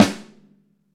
Ludwig Snare; Digital Sound Factory

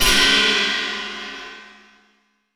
tech fx; Best Service; Hyper Dance; EFFECTS

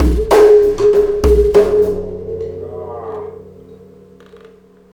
Alien Influence Beat, Processed Drumkits; Sonic Foundry (Sony Creative Software) - Processed Drumkits Zero Gravity Beats

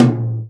tom, Real Drum Kit, jungle kit

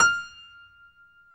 PIA GRDPI, Roland; Sound & Vision; Gigapack I CD 2; PIA Grand 5

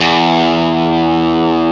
GTR Tube, Dirty; Club-50 - Foundations Roland

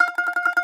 BAGLAMA T18R; E-MU Producer Series Vol. 7 – Old World Instruments

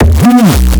Cool Reese Bass

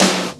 GATE SNARE, MPC-60 DRUMS; Greytsounds VOL-1

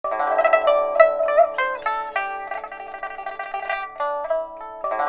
PIPA, 90; INIS - Opium; PIPA 100-C

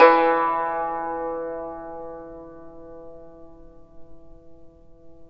YANGCHIN, E2; INIS - Opium

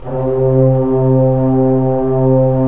BRS Tbns; Roland - LA Composer vol. 4

